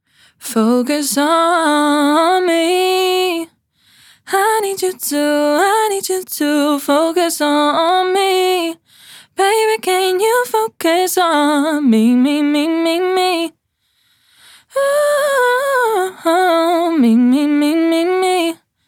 Bypassed Female Vocal; DelaySon

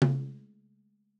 TOM HIGH, drum; t series drum pack

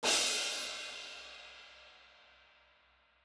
T CRASH HIGH, drum; t series drum pack

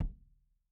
N KICK LOW, drum; Sonimus; n series drum pack

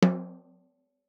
SNARE OFF HIGH; vintage console bundle

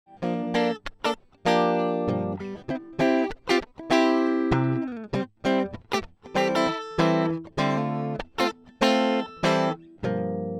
Funky GTR DRY; Sonimus

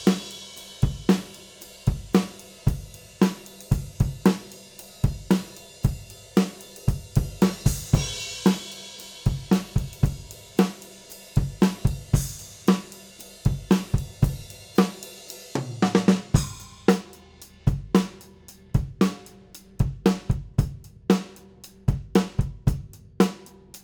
DRUMS DRY; SONIMUS NYCOMP

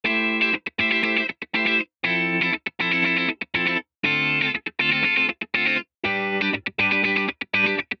Bypassed Electric Guitar; DelaySon

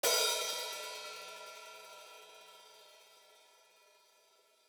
HI HAT OPEN, drum; t series drum pack

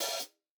HI-HAT OPEN CLOSE SHORT OPEN HI-HAT SHORT; A-CONSOLE; A-EQ

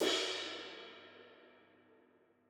RIDE CRASH HIGH HIGH RIDE; Sonimus; n series drum pack

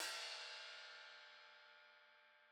S CRASH BELL, drum; Sonimus; s series drum pack; STONEQ 4K; SATSON; SATSON CS

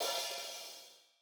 HI HAT OPEN HIGH OPEN HI HAT HIGH; A-CONSOLE; A-EQ

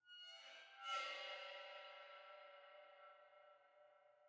RIDE FX; vintage console bundle

